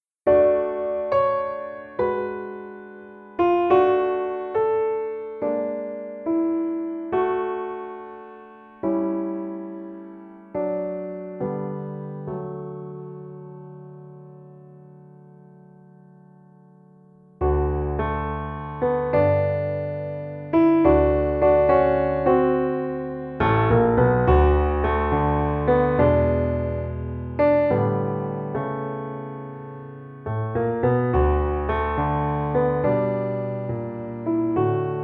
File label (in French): Piano seul